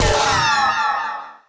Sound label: attack